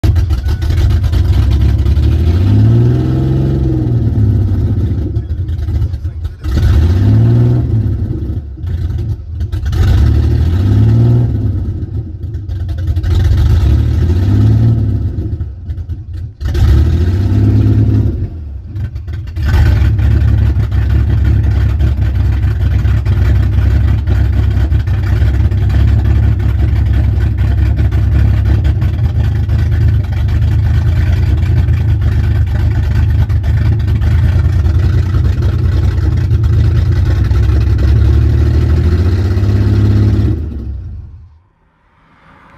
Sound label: truck sound; 2.9l 3.0l V6